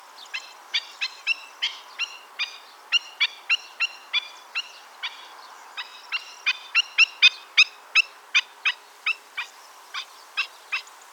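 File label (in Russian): hodulochnik golos, black-winged stilt voice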